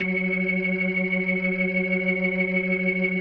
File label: F#3F, B3, Vintage Keyboards